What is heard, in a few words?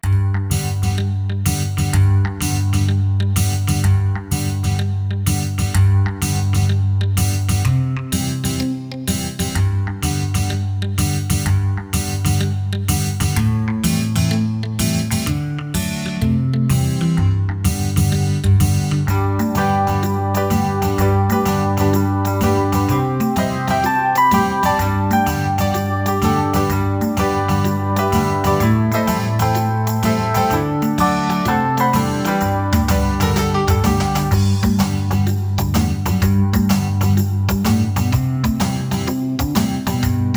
Song (Instrumental); Spotlight 2